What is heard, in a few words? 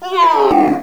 Wizard Die